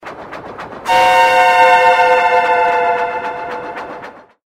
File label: Train Horn